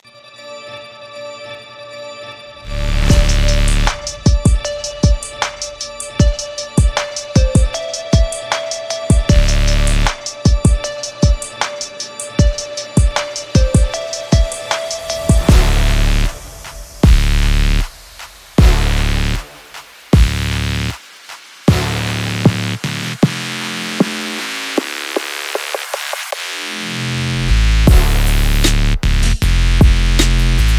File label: Dubstep Pack, Dubstep Drums; VST Buzz Dubstep Drums